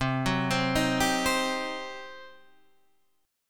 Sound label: G Major 7th Suspended 4th Sharp 5th C